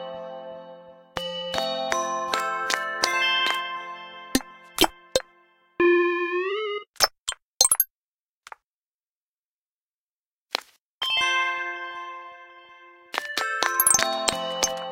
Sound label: Sidewalk Chalk; My Tamagotchi Forever; android; GSFX Sidewalk Chalk